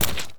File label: mud footstep